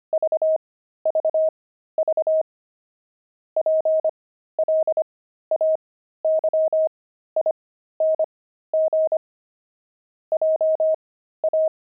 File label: pangram, intermediate pangrams files